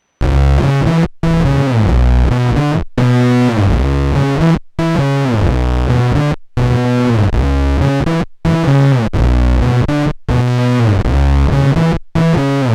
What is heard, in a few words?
octavius squeezer vco, bass